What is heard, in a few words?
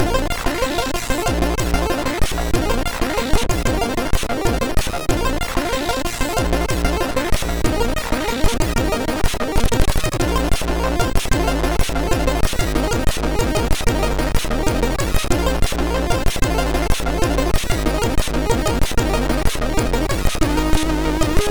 Splashing Waves, sid MOS6581R3